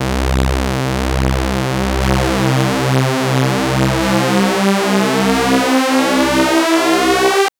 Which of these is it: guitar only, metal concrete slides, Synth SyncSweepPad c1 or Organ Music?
Synth SyncSweepPad c1